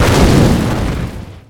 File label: explode